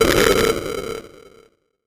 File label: Arcade video game explosion 8bit